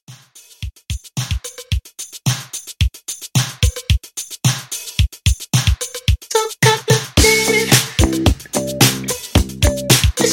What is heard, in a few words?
R&B Redrum 110Bpm